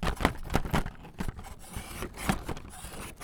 Pushing Plastic; BB Retail Therapy Sample Pack

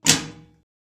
metal close; wood metal SFX

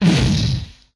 Explosion, retro arcade; Explosions; MMRetroArcadeSoundsPack

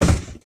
hit; breaking falling hit sfx

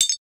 glass hit

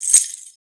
keys, wood metal; wood metal SFX

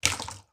slime; water splash slime

